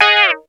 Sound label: tuners